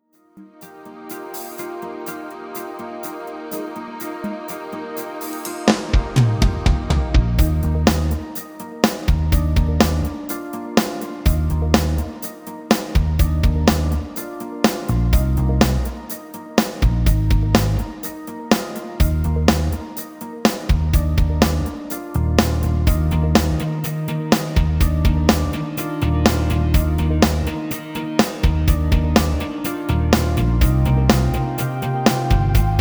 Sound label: rhythm